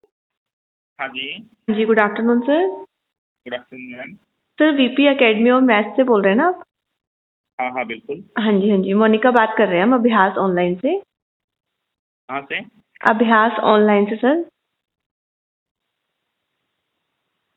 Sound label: Amb call